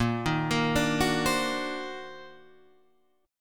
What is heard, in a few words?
Eb Minor Major 13th Bb